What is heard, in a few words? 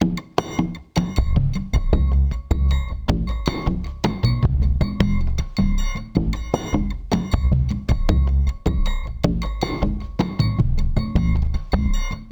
ICE BRUSH REMIX, Liquid Grooves